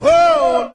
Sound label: "ho"; Voice; ooh ahh